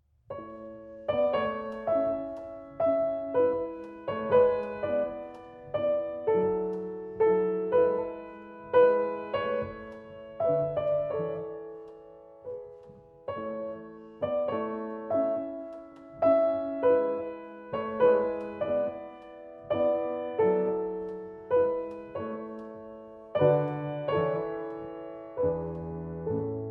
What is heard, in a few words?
Piano Sonata in A Major, Andante Grazioso